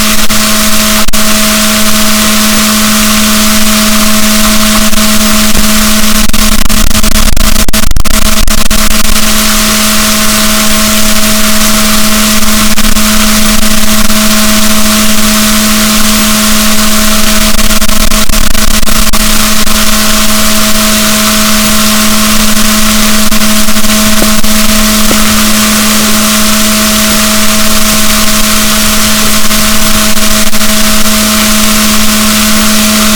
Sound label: rorquals negatif, boat